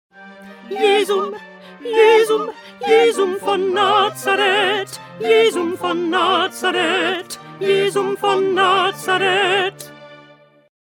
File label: Alto; Bach; St John Passion